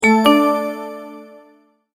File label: multimedia tone verified